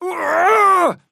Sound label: effort melee big; Trapper